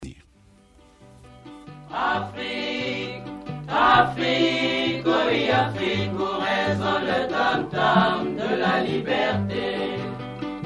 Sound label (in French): Chant; Les Cols Bleus